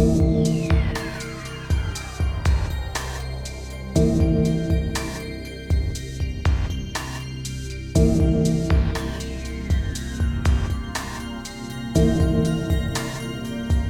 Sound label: snow; Lephisto